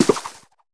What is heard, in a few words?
nesting gator spawn